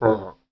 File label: zomb hit